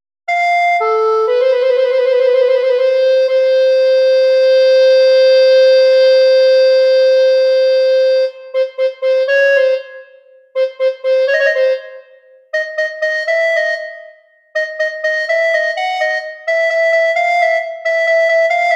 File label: Alto Recorder Cadenzas, three cadenzas connect the movements of Handel; HWV369; recorder sonata in F major; “Unexpected” is the key word here, these three cadenzas connect the movements of Handel’s recorder sonata in F major, HWV369; American Recorder Society